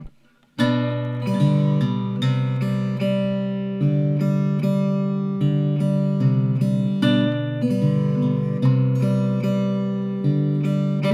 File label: Natural Finger Pick